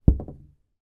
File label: foley wood pole lean on wall light gentle